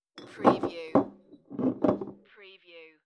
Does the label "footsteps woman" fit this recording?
No